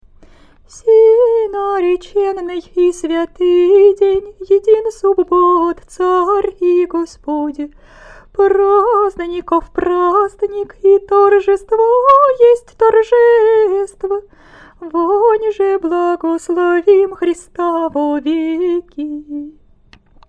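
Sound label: easter canon byzantine hirmos faster, singing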